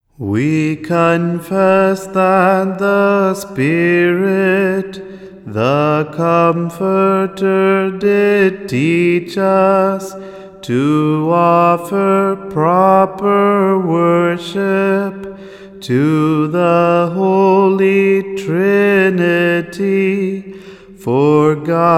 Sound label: Pentecost antiphon; Pentecost 17 - Service 3